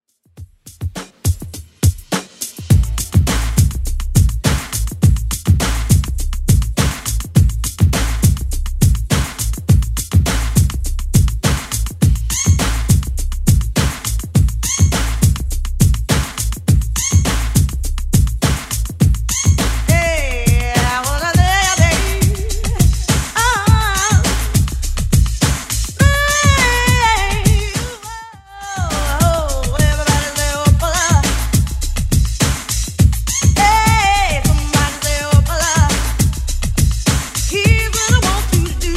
90s Redrum 103bpm

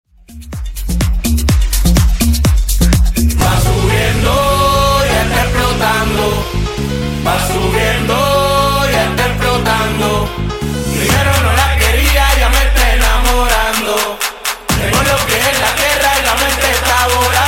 Latin, 125Bpm

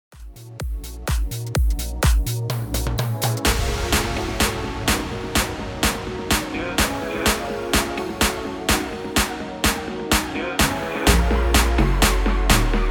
Remix 126 BPM

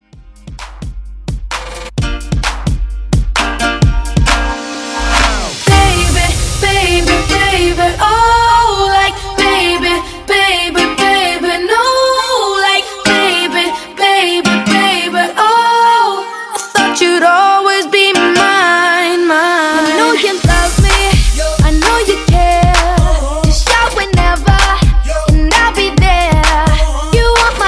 Dance Throwback Epic Vocal Intro 130 BPM; remixplanet

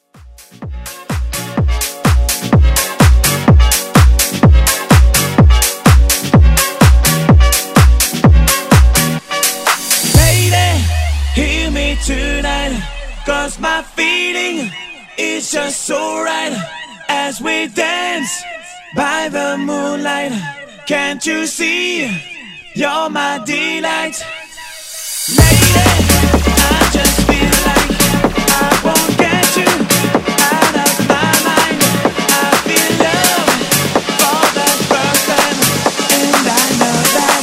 House Acapella Drop

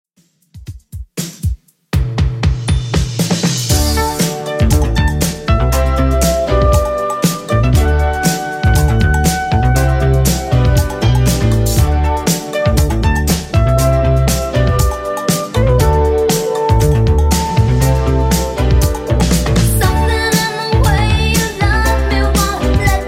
80s Redrum 119bpm

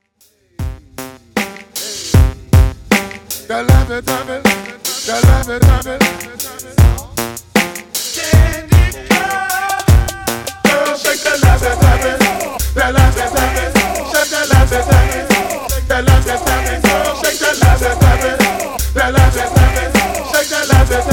Hype Redrum 155Bpm

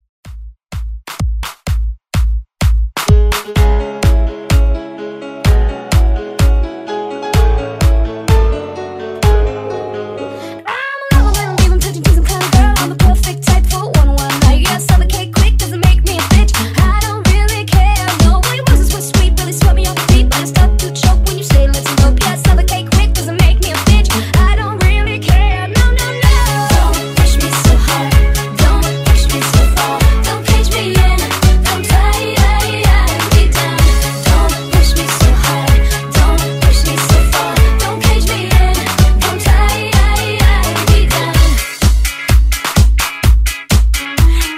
Dance Redrum

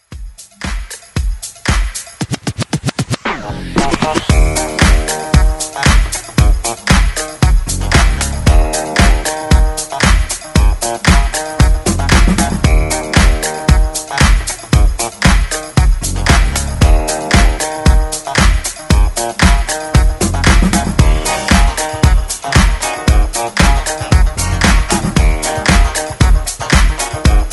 70s redrums clean

